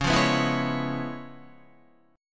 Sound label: Db 7th Sharp 9th Db, down, Guitar; strummed; 4E,4A,5D,5G,5C,6F; JGuitar